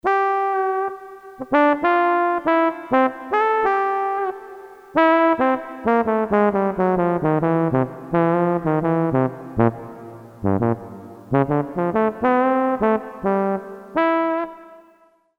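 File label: TROMBONE, Korg DSS-1, Brass; TROMBORN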